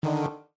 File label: fail; sorting